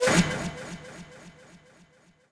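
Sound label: ricochet hit modules, tanks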